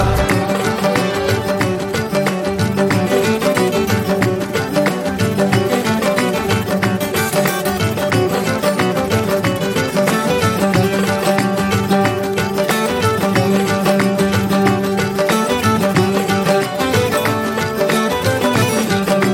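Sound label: instrumental; Once Upon A Time In Mumbai; Indian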